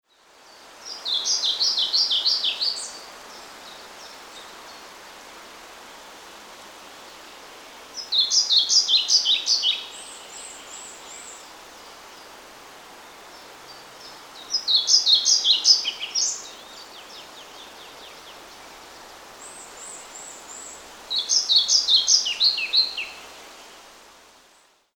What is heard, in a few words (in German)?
Trauerschnaepper